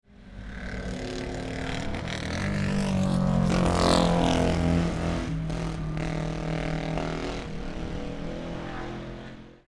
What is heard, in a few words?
Lancia Pagrada Formula Junior, engine; 1959